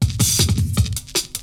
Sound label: BREAKBEATS, TECHNO